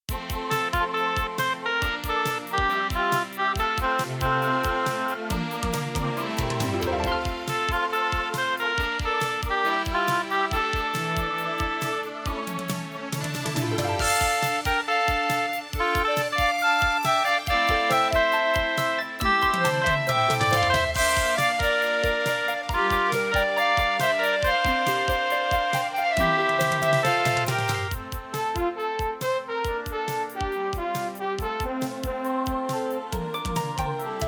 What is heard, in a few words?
SC 55mkii